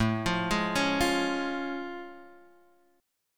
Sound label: A Augmented Major 7th A, Guitar Half Step Down